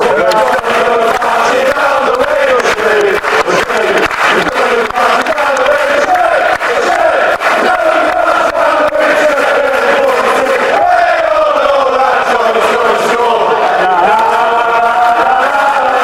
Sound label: oshea oshea fanchants